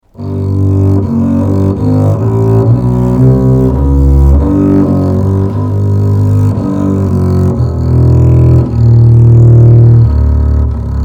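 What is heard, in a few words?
antique double bass sound sample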